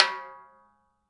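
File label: TIMBALE HI EDGE; Sonic Reality; Interactive Drum Kits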